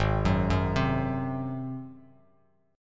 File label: G sharp 7th G sharp, Bass Standard; G#7; arpeggiated; JGuitar